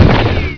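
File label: tank shot; duke nukem total meltdown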